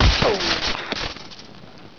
debris; XDM

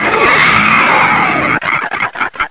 zombie attack; XDM

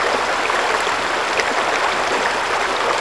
water, ambience; XDM

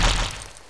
push flesh; XDM

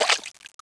slosh; XDM

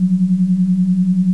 laser; XDM; ambience

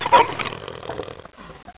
zombie idle; XDM

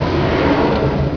push wood, debris; XDM

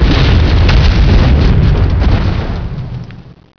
mortar hit; XDM

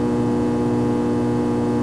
electr; hl1mp

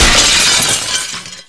bust glass, debris; XDM

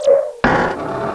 portal jump; valve; hq

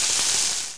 grenade acid, weapons; XDM

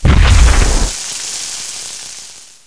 grenade exp, weapons; hl1mp; XDM

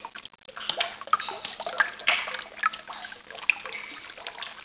drips; XDM; ambience

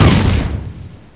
ball hit; XDM

